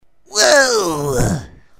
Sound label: WEEOOOA